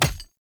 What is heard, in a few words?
Grunt Death Default; Sinecure; Melee Grunt; Enemy; Death Effects